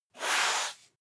broom sweep hard slow

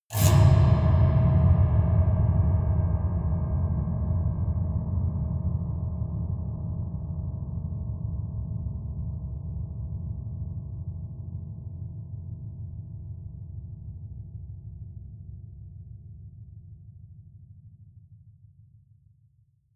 piano twang multiple fast dull

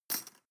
cutlery teaspoon drawer place

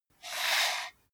computer mouse draw

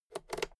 fader slide click quick

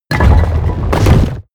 mechanical lever stone medium up

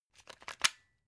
plug unplug clatter

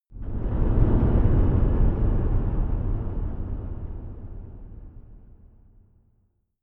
whoosh short rumble

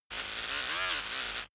servo overload

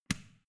switch small turn on

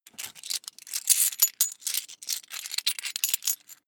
coins big clatter long

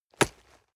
nylon leather hit

